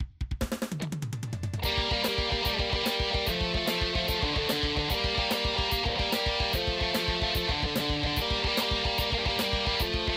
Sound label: Power metal